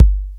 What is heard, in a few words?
808 KICK, 12-Bit Drums; E-MU Formula 4000 Series Vol. 1; Hip Hop Nation